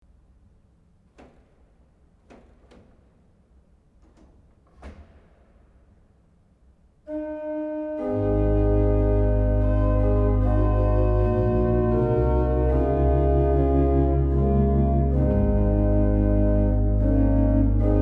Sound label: Rohrflute 8 Viola Di Gamba 8 Tremulant; FreibergDir1.03; Amazing Grace